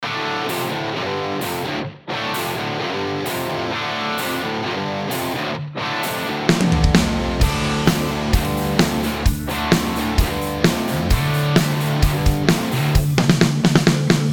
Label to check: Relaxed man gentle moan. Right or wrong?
Wrong